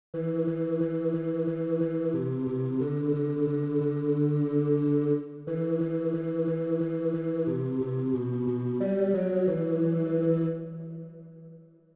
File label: Shanty Bass Vocal